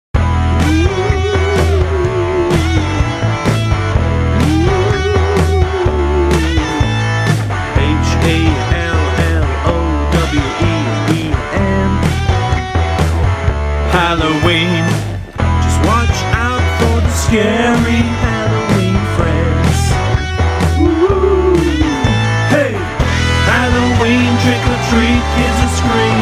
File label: halloween rock